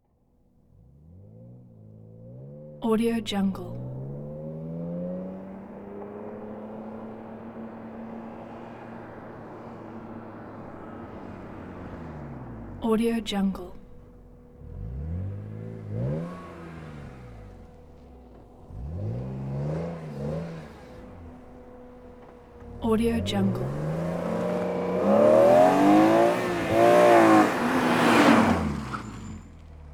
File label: 2002 bmw m3 car start; prosoundfx